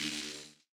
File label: shock; CrossCode; battle